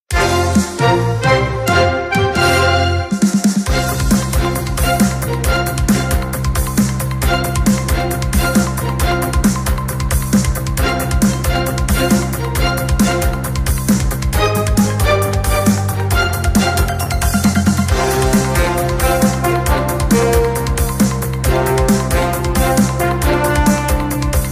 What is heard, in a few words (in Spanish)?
Música de fondo para noticiero Cortina musical para noticias reportaje e investigación